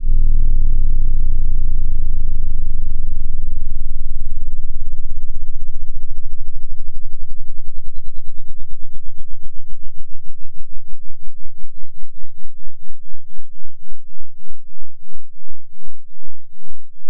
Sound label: Triangle